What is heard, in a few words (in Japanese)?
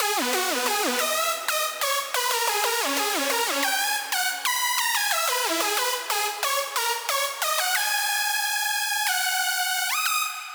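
Lead Avenger, 打って変わってかなり派手ですね。; リードレイヤー②：Avenger; ソロで鳴らしたのがこちら。; AvengerはOSCの数も波形の種類も多いためゴージャスな音が得意です。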